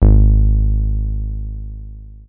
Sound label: Synth oneshot Long Ab, Synth Bass One Shots; Slate Digital BASSHOLE Sample Pack